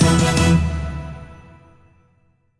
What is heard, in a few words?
big Win End; netent; kingof3kingdoms